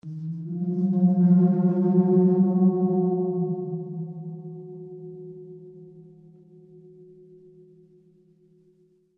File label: CATCH; eskimo